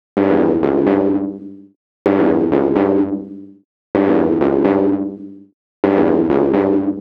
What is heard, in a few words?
STABS 127BPM